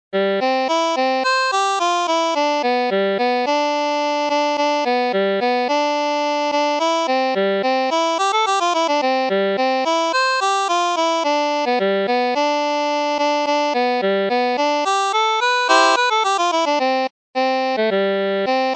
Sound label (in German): Polka (Volksweise) - 2 Harmonikas; Petruler Polka